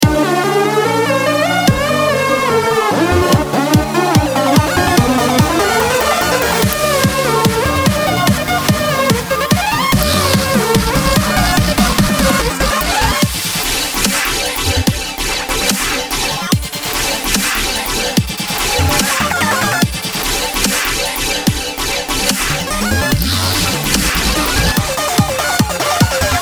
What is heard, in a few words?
COLORBASS; tutorial